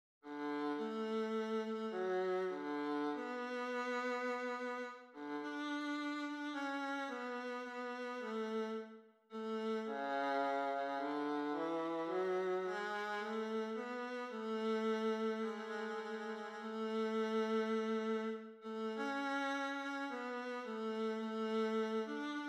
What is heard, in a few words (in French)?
hymne national danemark; kra yer hans ernst